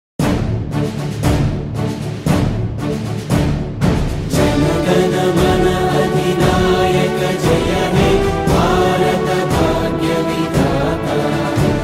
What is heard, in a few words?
indian national anthem jana gana mana vocals